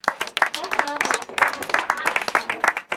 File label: small group of children clapping